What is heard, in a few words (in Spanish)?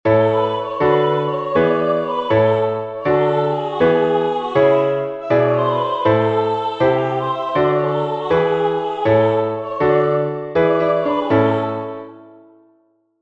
entonacion piano; Entoación con acompañamento; Exercicio 2; Melodía e acompañamento